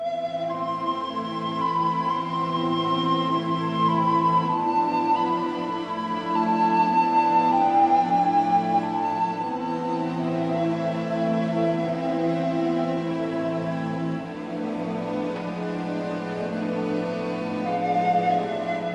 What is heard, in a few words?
flute, Loops